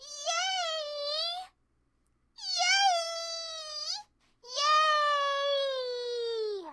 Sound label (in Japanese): イエーイ